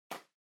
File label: Packaging Air Cushion Far Pop; 梱包用エアークッション; 遠い 弾ける; 梱包材